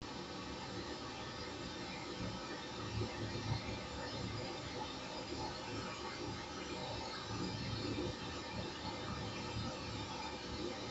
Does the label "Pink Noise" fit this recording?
Yes